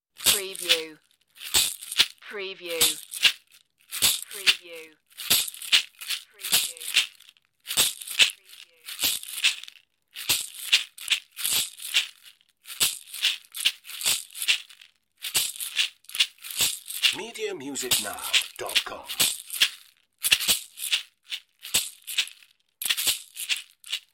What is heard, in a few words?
Caxixi